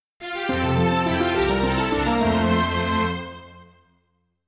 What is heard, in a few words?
Calm Message; Wii Room (Shopping Update) Soundtrack